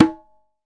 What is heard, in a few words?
Dholak Tun; Roland BK-5; Percussion